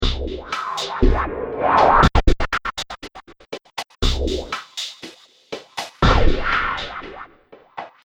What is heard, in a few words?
120 Bpm Space Drums Loop; Drum Loops; Percussive And Rhythmic; Seamless loop; Andrew Fleming